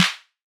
funky disco clap

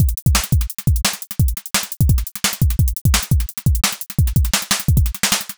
172 bpm jungle breakbeat loop 4 bars

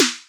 snappy jungle snare hit

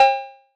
disco cowbell hit

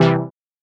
synth brass stab

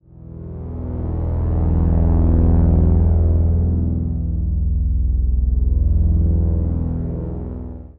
dark cinematic drone in e

